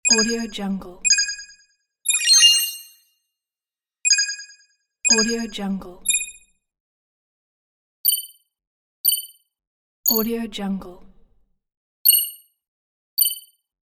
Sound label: simulate countdown